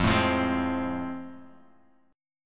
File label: A Minor Major 7th Sharp 5th, F